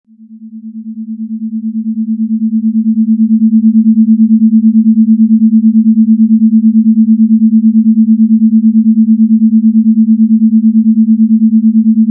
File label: Binaural